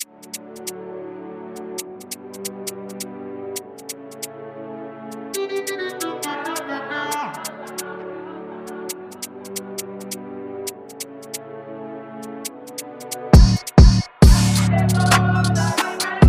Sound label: Drill Version; Naijaremix